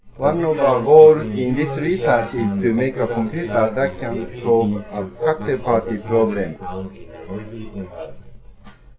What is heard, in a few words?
trio